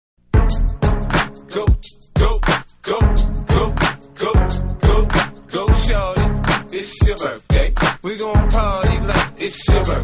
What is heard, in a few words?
musical chairs; cstrike; jb rush